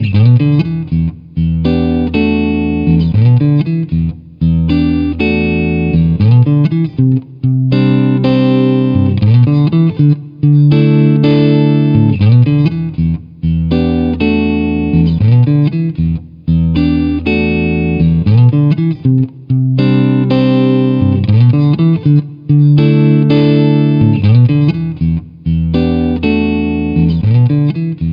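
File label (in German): Halspickup, guitar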